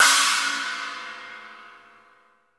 CHINA, Drum Dry; East Collexion; Drum 1 Dry

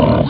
bang; ST-XX Amiga Soundtracker Sample Packs

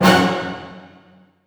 C MAJ FF, orchestra hits; Sound On Sound - Peter Siedleczek's Orchestra

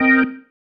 Stab One Shots, Organ

